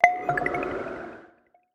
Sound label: ui objective update end soft